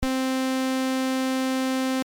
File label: Main Lead vcf